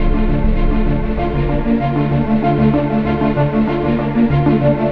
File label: strings, sad loop